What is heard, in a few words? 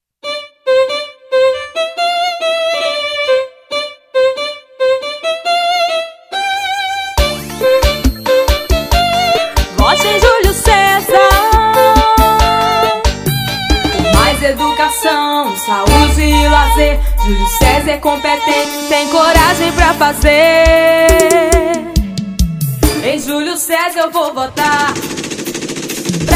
jingle